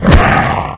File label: claw strike, zombie